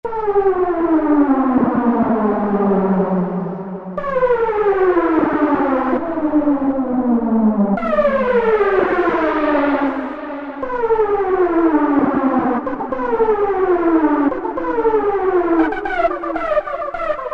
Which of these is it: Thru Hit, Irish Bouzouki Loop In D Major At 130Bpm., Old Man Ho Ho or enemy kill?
Thru Hit